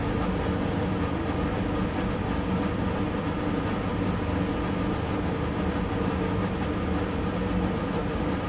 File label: BGM AMBIENT HOSPITAL SHORT